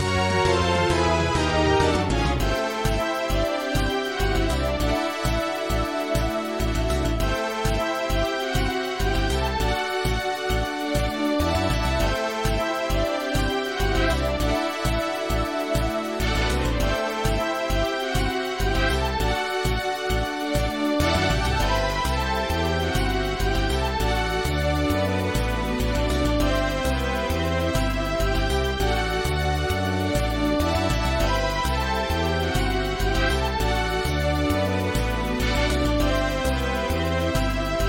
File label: Battle, bgm